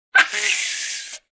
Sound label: Baboon Whimper Nasal